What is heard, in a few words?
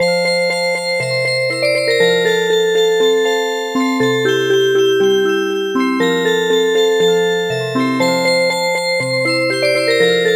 Polka Music Box; Helena Polka